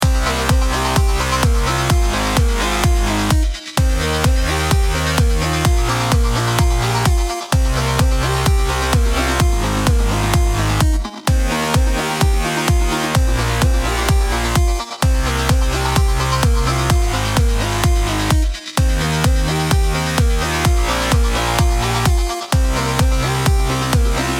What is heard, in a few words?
EDM DRY